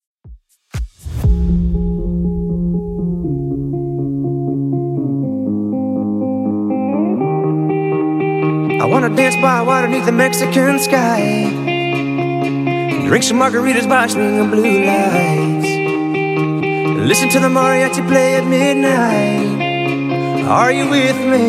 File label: Redrum 121bpm